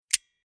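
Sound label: Tools Monkey Grips Closing Spring Release Clicks; Hand Tool Sound Effects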